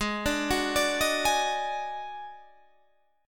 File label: Eb Minor Major 7th Double Flat 5th